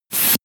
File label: air pressure release